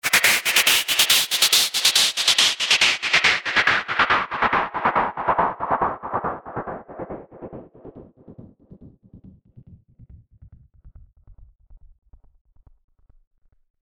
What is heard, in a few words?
Pink Noise Effix Delayed; Techno